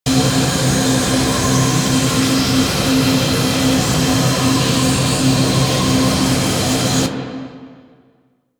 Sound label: Last Portal Open Loop